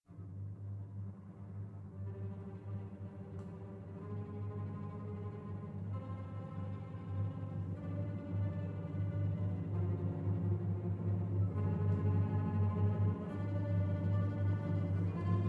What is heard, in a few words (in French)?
Quatres Nuits pour soprano et orchestre